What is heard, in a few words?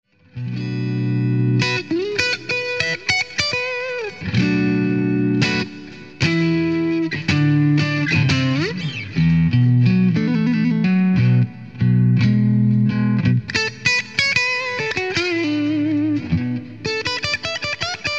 Blues Thang